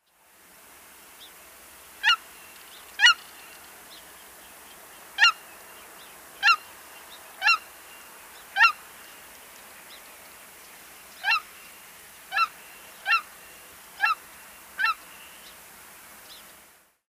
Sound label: Cackling Goose Branta hutchinsii; waterfowl